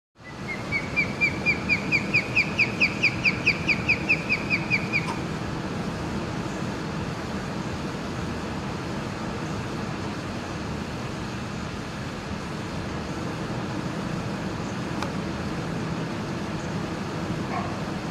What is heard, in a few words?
Osprey